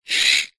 leisure rc car roll wheels mechanical whirr short

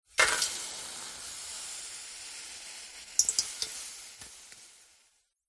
liquid water ice drop hot metal sizzle burn; zapsplat

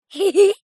child boy 6 years laugh short; zapsplat

